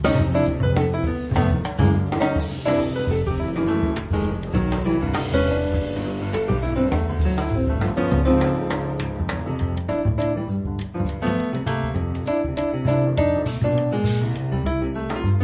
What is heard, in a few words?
Music Box